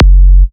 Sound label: Minimal Kick, Drums, Kicks